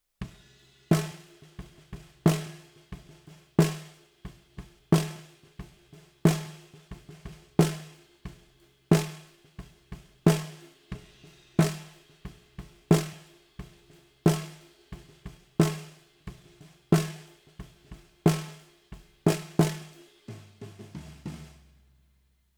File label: SNR B